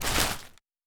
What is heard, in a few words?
Forest